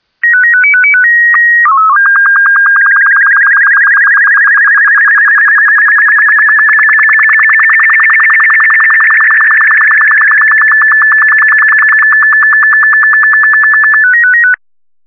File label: sstv; Kerbal Space Program; Chatterer